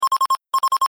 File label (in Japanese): mezamasi